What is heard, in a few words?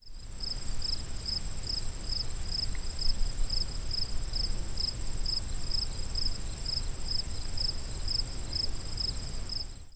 Baker Island, Acadia; PARKS